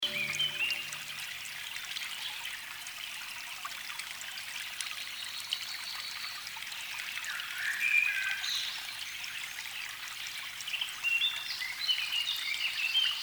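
Zvuki lesa, SHum letnego lesa, forest sounds, noise of the summer forest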